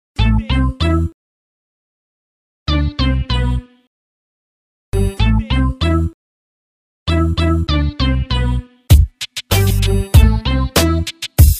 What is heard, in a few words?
Instrumental